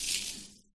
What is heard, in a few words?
bush enter